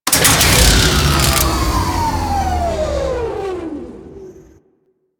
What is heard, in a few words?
Ocean Shutdown; Ambience; Props; Warframe; Grineer Ocean